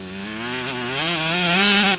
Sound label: low, Engine